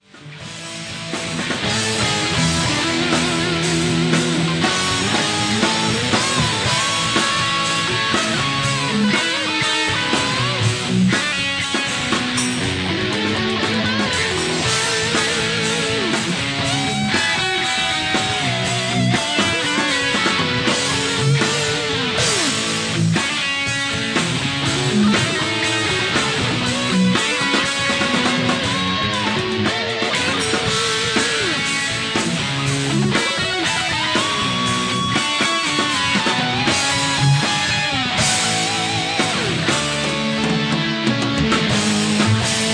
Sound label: groove guitar solo